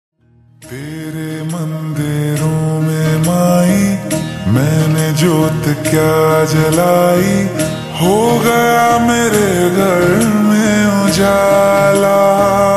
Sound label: Slowed Reverb Ringtone